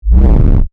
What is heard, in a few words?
Synth, Square, Wave, Blip; Stabs